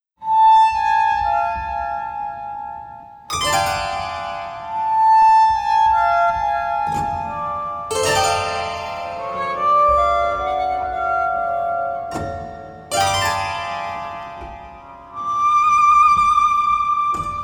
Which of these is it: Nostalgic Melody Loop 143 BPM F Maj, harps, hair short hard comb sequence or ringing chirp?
harps